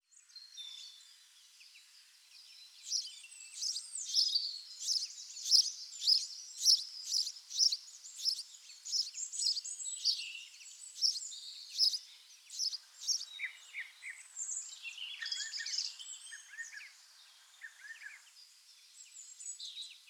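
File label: Ambience birds; Game Builder Garage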